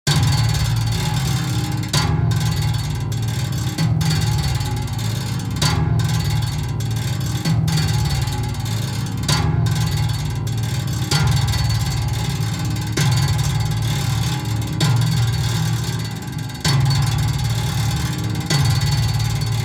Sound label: Perc FX Rattle Hits